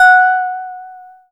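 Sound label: synth Harplike; Best Service Gigapack 1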